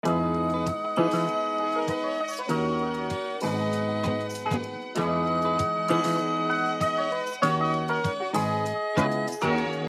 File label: vibes 195bpm